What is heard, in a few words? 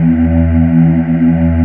Choir